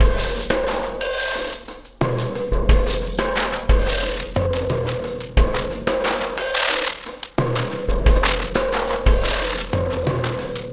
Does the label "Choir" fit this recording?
No